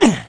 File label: hurt